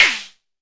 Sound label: mbrute sn, Arturia MiniBrute; DRUM MACHINES